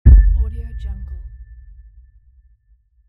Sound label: cinematic kick